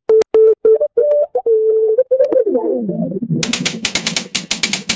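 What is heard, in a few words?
typewriter continuation; generative ai audiocraft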